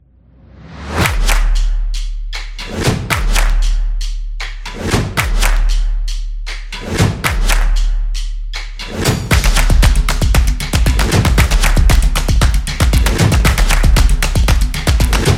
Percussion Trailer

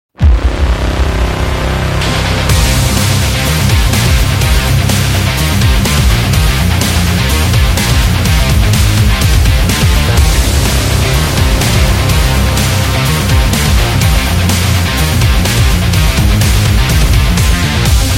Racing

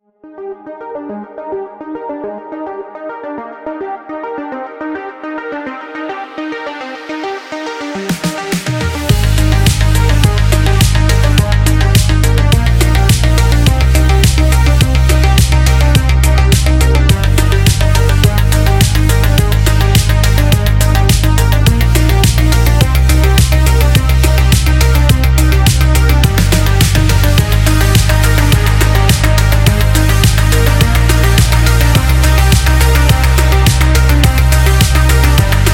Synthwave

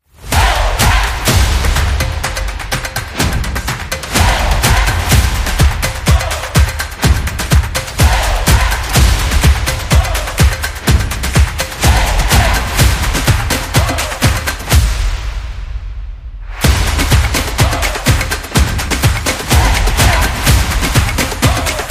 Drumline Performance

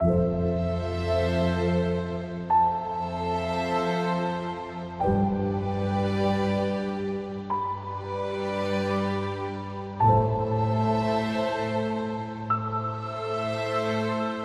Emotional Piano

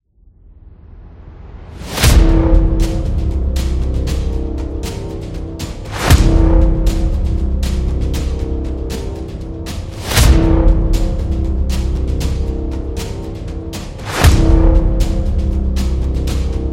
Battle Drum

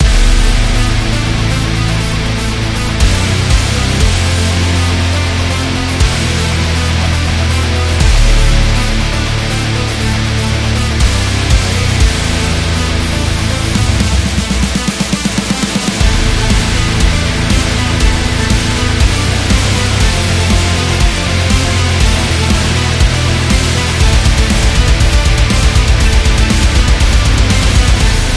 HIIT Metal

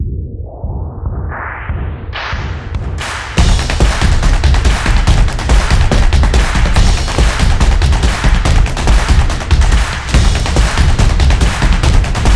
Stomp Claps

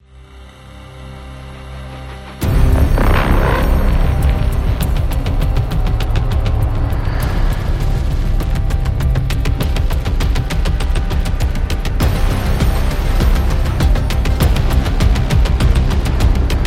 Thriller

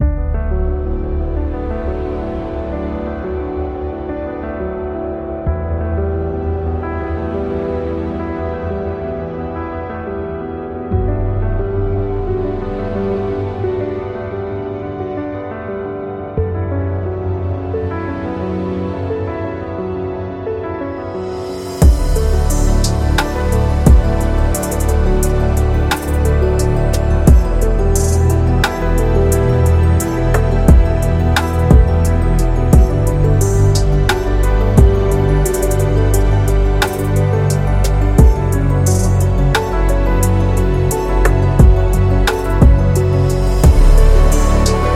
Slow Background Music Ambience; lounge

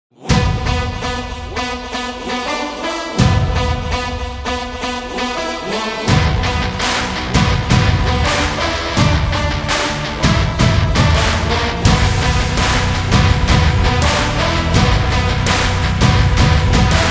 Indie Rock Action

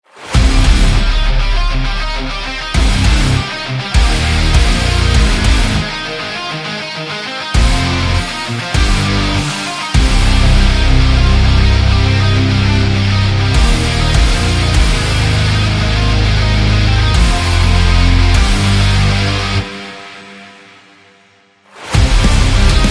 A Heavy Metal

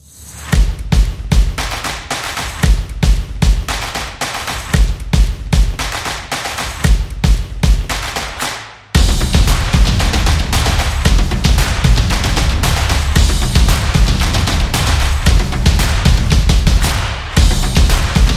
Drums Intro

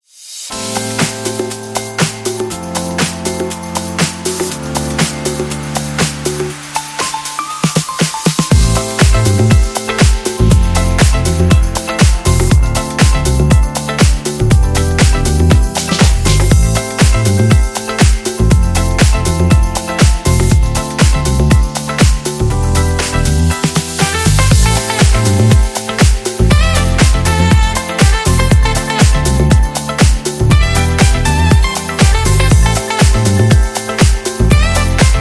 Fashion Disco House

